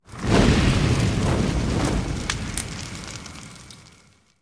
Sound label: Great Fire Wave; Skill